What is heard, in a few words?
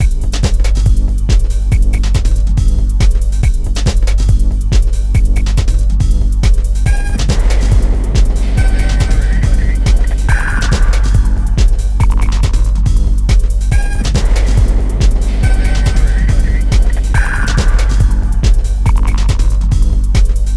running groove